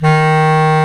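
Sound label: bass clarinet, Orchestral Winds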